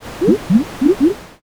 poison

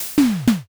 press

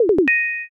potion

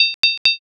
coin insert

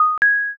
intercom

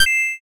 judge perfect

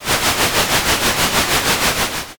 comm noise

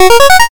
power up

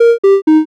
picosad

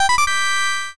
quest clear

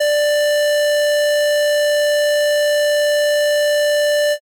ecg flat

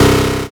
zap

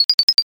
bat

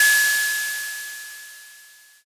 ride cymbal

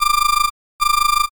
black phone

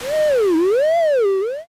door creak open

